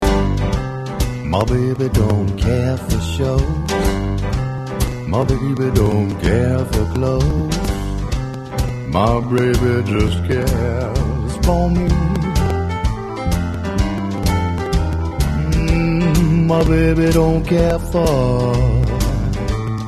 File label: SWING